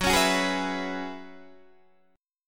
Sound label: Gb 9th Suspended 4th Gb down Guitar Open D, chord